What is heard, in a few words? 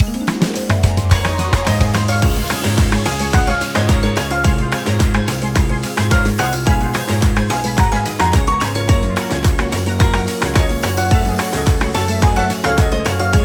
BGM COLLECTION